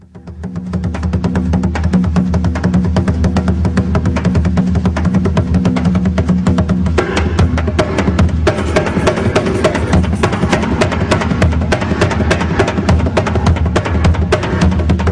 tantã, Instrumentos

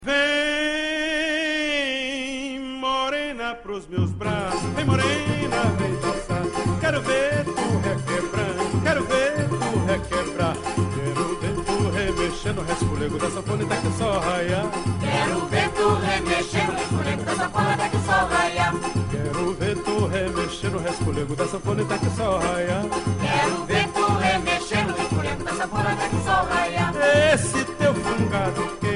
zabumba, instrumentos

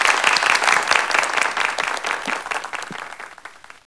palmas; Adivinhações - CPII

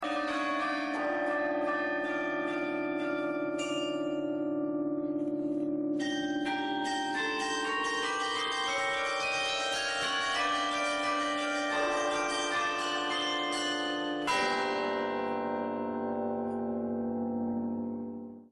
Carrilhão; instrumentos

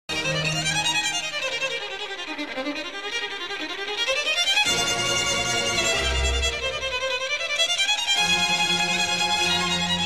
violino; instrumentos

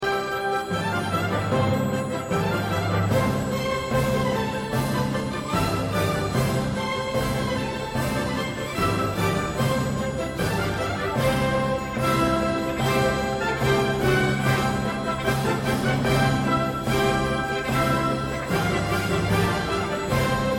pratos msc; instrumentos

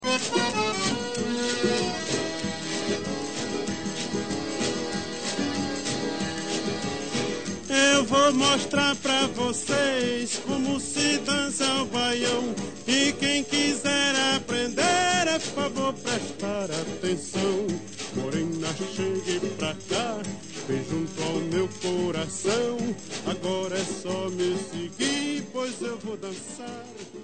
baião; instrumentos

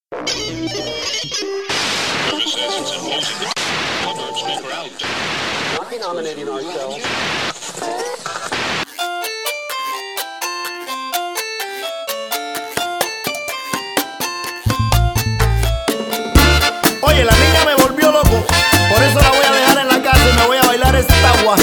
TRECHO CHA CHA CHA; PGTIAE 2011 - Ritmos Musicais

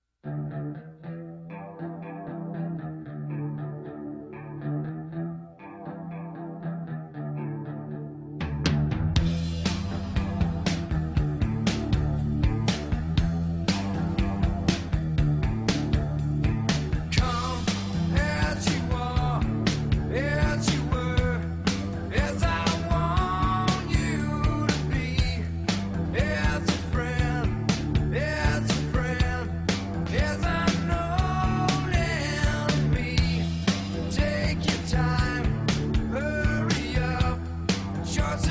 baixo; acerto

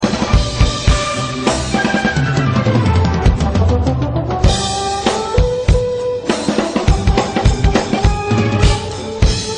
bateria; instrumentos

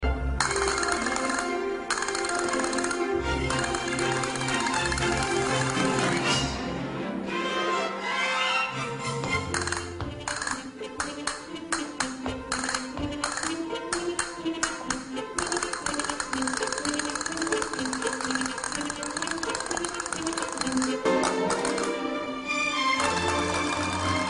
castanhola; acerto

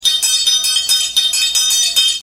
triângulo mini; instrumentos

